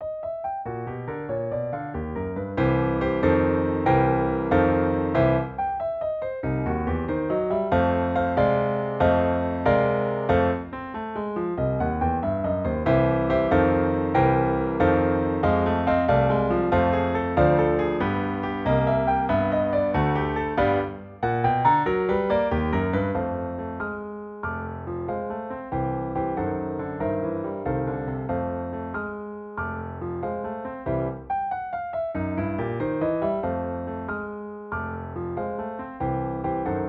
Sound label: 8 Bit Blues